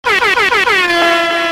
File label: Airhorn; Infiltrating The Airship